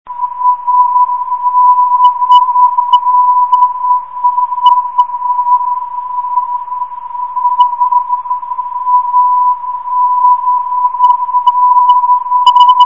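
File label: abd 460khz, non-directional beacon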